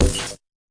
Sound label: fx drum, Electro FX